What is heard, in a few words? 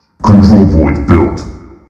controlpoint built